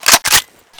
ru-556 pull; weapons

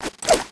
grenade throw

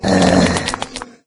evade right; doom3

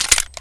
clip out; kriss

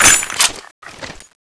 clip in; tfa cso; monkey wp set1

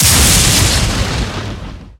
lightning spear cast

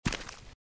giant rat foot; npc

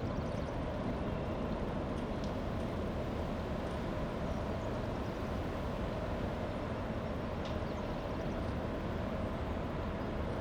monastery outside; soundscapes

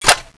clip out; tfa cso

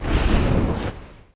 flamethrower end

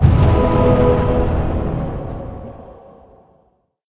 revive

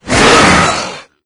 attack solo, maggot; doom3

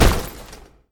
step

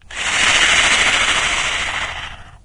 breath, imp; doom3

